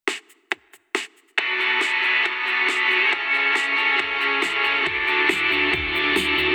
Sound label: guitar spoken